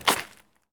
jump concrete; Escape from Tarkov

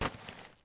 backpack drop; Escape from Tarkov

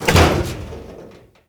Aircrafts FW-190D9 Gear Lock; DCS

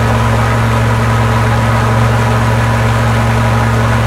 bomber cockpit, engines; War Thunder

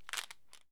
pills bottle put away; Escape from Tarkov

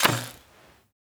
bow attack; Rust

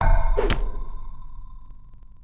Build Axeman; Civ4 warlords

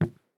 walk wood; Escape from Tarkov

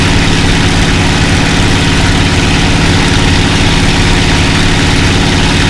mustang cockpit, engines; War Thunder

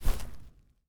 Footsteps Grass; DCS

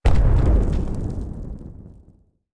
new artillery; ambient; World of Tanks Blitz; neptune

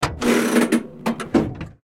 transmission lever, tanks; War Thunder

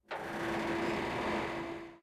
ambient port; World Of Warships; port3d

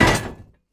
repair bench deploy; Rust

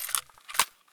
m4 check bolt in; Escape from Tarkov

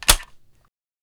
Inf Weapons Foley; Red Orchestra 41-45

